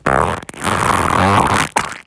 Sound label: farts